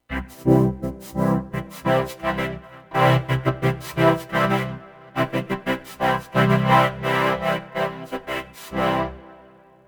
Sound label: big swell, analog synth vocoder